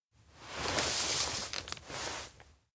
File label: map takeout; anemoi